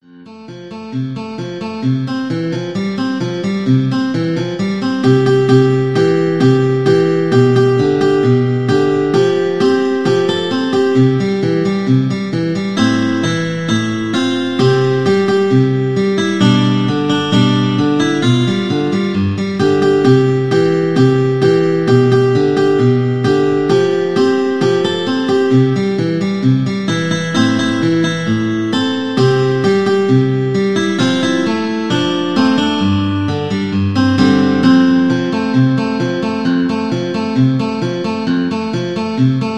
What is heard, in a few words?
synth